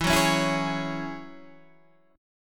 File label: G sharp 7th Suspended 4th Sharp 5th E